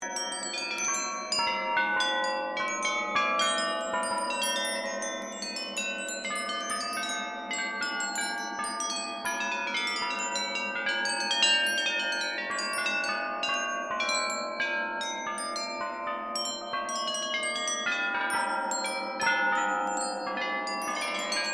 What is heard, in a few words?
AMBIENCE ENDLESS CHIMES; Various Ambience created through sound manipulation in FMOD; Artificial